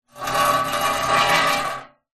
foley metal large frame scrape drag screech concrete